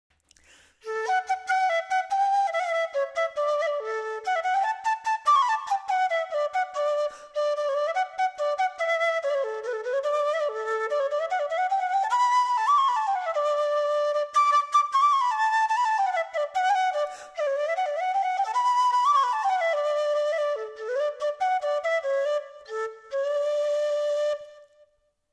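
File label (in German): Querflöte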